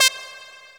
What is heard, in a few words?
lead, synths